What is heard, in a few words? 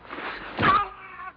Falling; graphicNovel